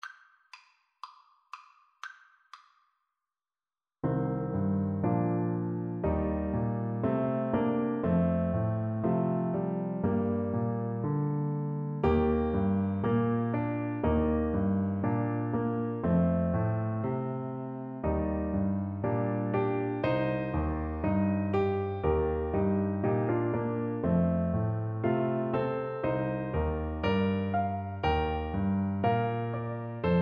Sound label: wondrous, Tuba